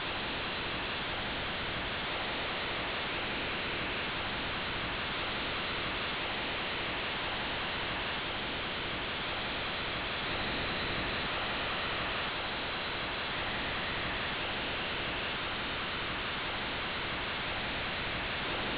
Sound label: Huygens Sound after Impact; astronautika; kasini; hajgens